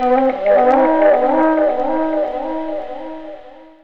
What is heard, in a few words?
FLUTFX; E-Lab; X Static Goldmine 1